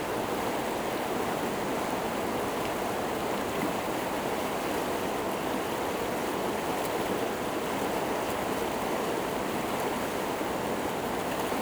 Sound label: Small river